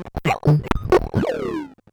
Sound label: Bent Talking Teacher, Circuit Bent